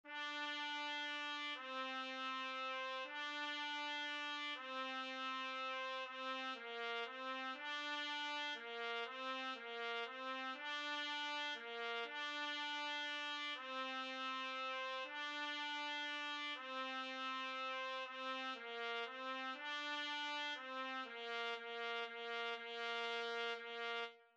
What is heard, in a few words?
trumpet